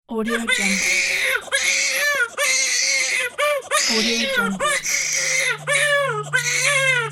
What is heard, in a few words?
baby crying